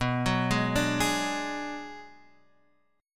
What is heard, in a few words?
B Minor 6th B, Guitar Half Step Down